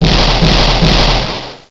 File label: train damage trio; paynetrain